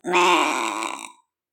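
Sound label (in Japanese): hitsuji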